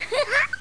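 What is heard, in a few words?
egg laugh, dino; My Animated Word Book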